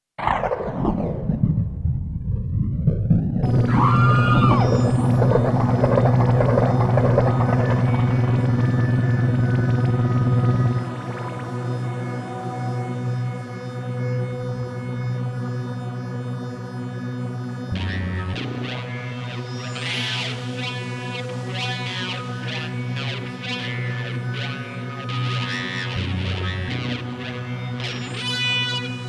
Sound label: space intro